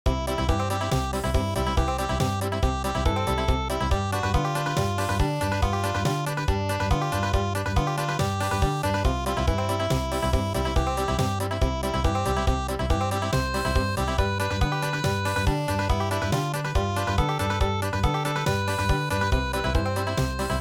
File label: Country